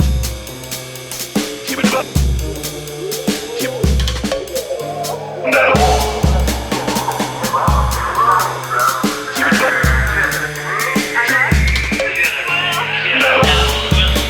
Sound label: Squirrel Jazz